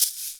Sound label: Shaker; Equipped Music; Loungin House